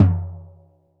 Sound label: TD-20 Tom A, Roland TD-20 Toms